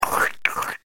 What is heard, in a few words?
lick